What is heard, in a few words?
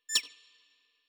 Future Sounds, Interface Futuristic; ArmyRaid